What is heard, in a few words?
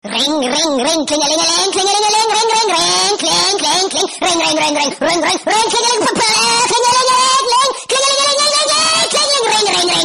Psycho Frog Ring Ring